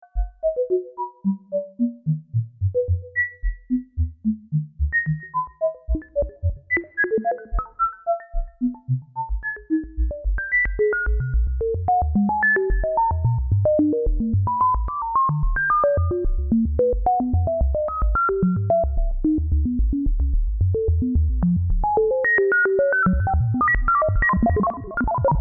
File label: LFO to Filter Frequency